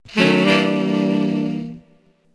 SAX

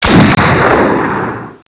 Rifle

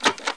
SWITCH CAMERA; Titanic Challenge of Discovery Panasonic WIN95

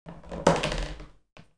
drop ball

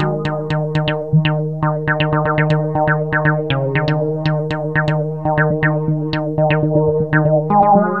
INDUST, Rythme

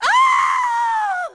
scream fall

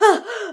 Woman Die; gangsters; English

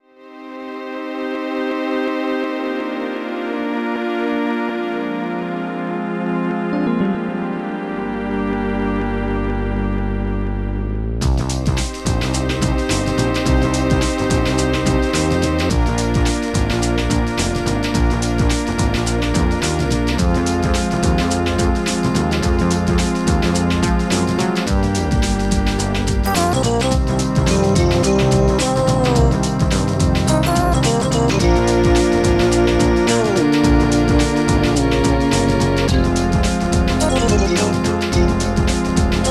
swing-beat

